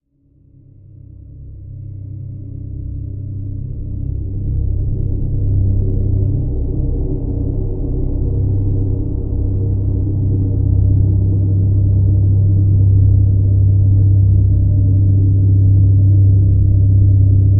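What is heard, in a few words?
drone C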